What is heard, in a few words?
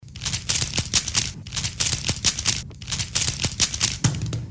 Rubiks Cube